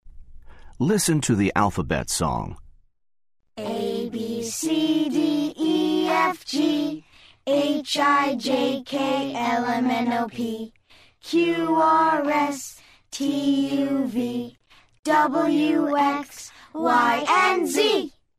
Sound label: english alphabet song